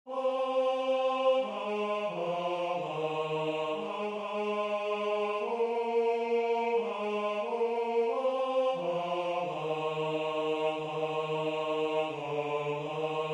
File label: Alma Mater TENOR II; Second Tenor; Rehearsal Recording